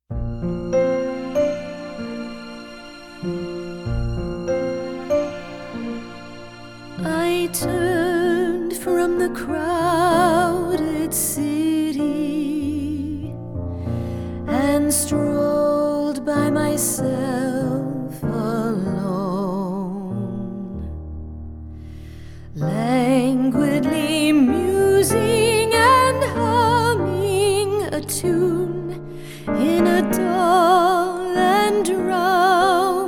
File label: Evening Bells Choir